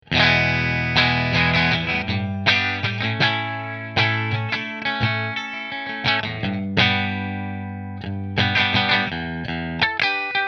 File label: Melancon Bridge Mini Position 2 Fender, guitars